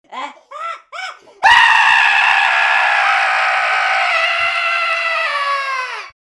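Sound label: scream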